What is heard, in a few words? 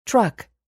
truck